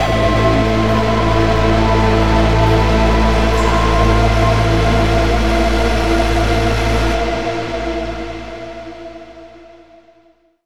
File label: Overlay Drone; Act2 Rezoning